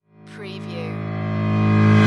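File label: Production Element Reverse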